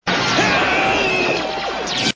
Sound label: yell hit; Rodimus